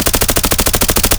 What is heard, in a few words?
vss loop close; Escape from Tarkov